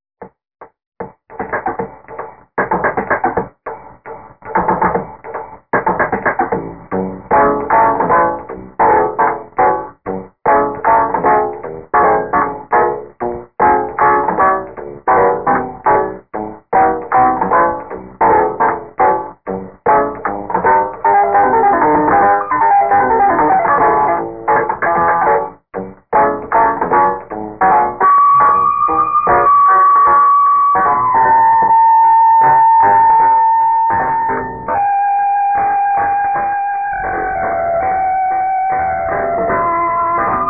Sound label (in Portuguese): bateria